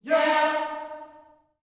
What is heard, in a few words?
"yeah", gospel vocal, D#4; Spectrasonics; Vocal Planet Vol.1 Gospel; akai